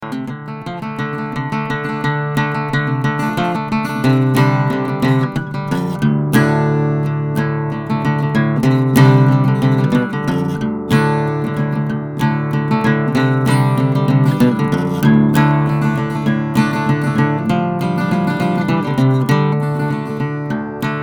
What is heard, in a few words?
Acoustic; Raritan